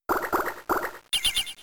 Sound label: Milk Drink; Milk Drink part 1